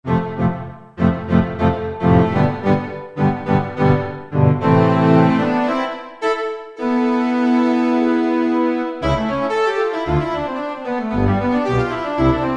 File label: ST Quartet, Yamaha TG77; Factory Presets